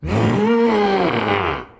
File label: groan, zombies